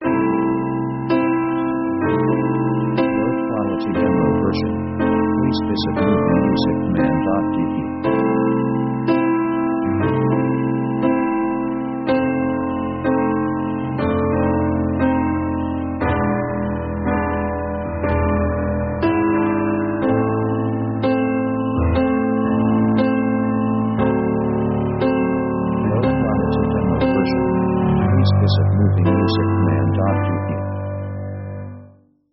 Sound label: pop piano lazy; orchestra themes